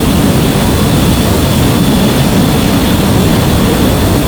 afterburner loop, weapons; tf2classic

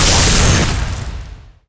solarflare shoot; tf2classic

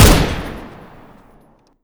machine fire; weapons; tf2classic

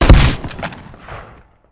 air burster shoot, weapons; tf2classic

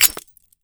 grenade pin pull; tf2classic

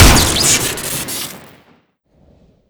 lmg shoot crit, weapons; tf2classic

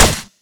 tranq wood shoot; tf2classic

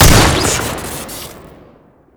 trench shoot crit; tf2classic

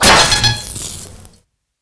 bfg disarm, weapons; tf2classic

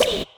woosh; discipline device; tf2classic

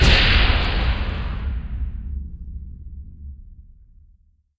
explosion; bfg; tf2classic

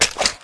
hk45 draw; tf2classic

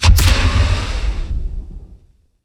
sticky jumper explode; tf2classic

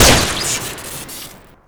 heavy syringe shoot crit, weapons; tf2classic

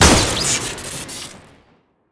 elites crit, weapons; tf2classic

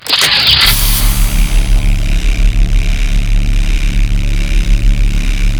bfg charge; tf2classic

